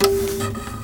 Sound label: SQUEEK, Nylon Guitar